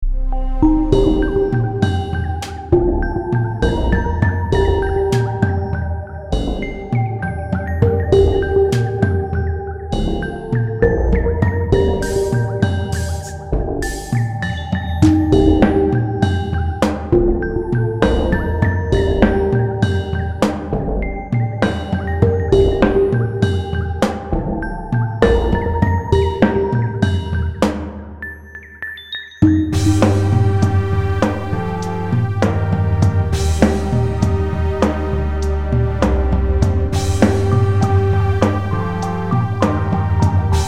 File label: Calm popgun; popgun tracks